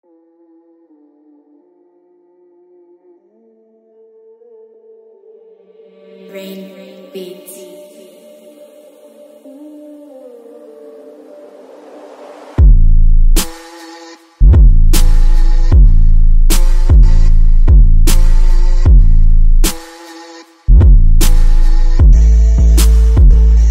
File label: Bass System Car